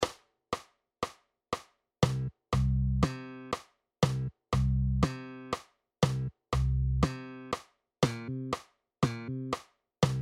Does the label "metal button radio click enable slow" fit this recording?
No